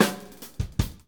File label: Drum beat, Synth